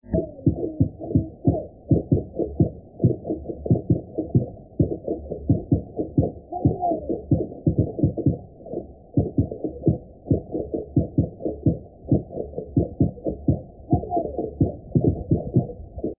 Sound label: roof tile music; lumbung commons; humdrum press